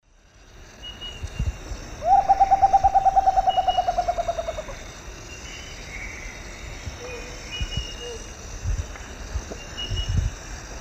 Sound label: Nyctibius bracteatus, song; S. America; Eastern Lowlands